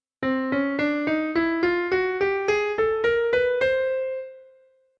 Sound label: Chromatic Asc